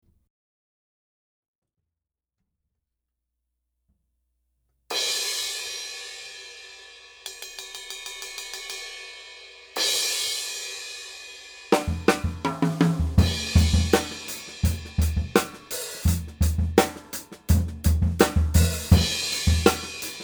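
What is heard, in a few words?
16 sabian, cymbal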